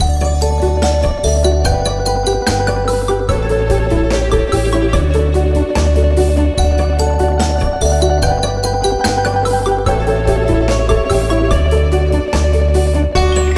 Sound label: karaoke